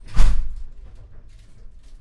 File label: Train Door Slam